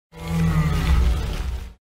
Aloe Turtle roar

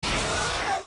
King Cobra roar

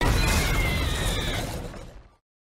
Killer Chameleon roar

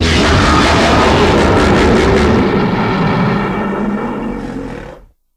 Roar; Grand King Ghidorah Heisei

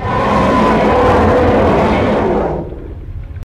roar; Kong; TMK

